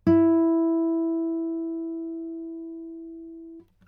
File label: guitar original